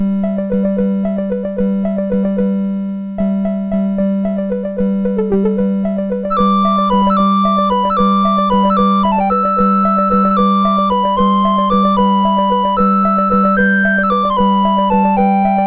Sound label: MOS6581; MOS6581R4